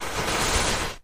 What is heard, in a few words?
Matches Book Lit; lighters & matches